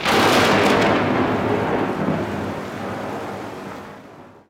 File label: thunder